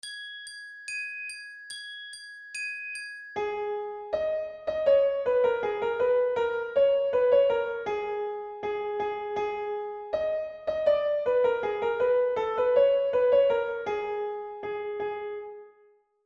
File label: melodic dictation